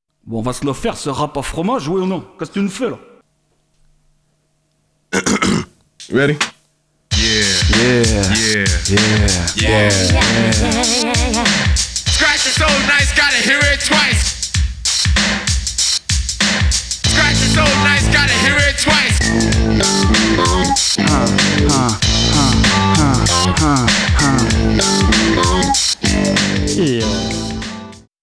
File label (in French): le rap du faineant